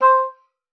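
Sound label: C5, oboe d'amore; Xsample VOL-7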